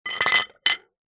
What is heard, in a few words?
foley wall tile small set down on concrete; zapsplat